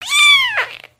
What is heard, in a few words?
Kitten Meow; creatures organic